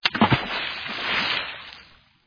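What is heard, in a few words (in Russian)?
вода одиночный шумный всплеск приглушенный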